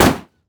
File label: blue minion mech basic attack; LoL